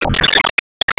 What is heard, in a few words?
Enemy Tower Active Shot; disney star smash